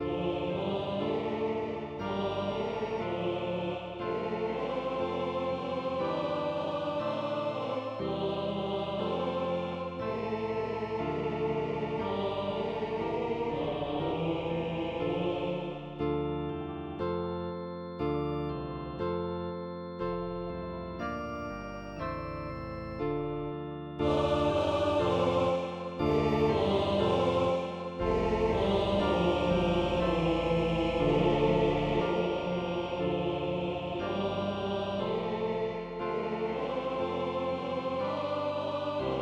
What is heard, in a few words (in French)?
Kyrie avec invocations prealables, Audio a 4 voix; Messe de Verrieres; Partition a 4 voix